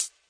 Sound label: classic hh Bld H2, Classic Hip Hop; STYLE KITS (Hip Hop, Etc); Classic Hip Hop Mega Kit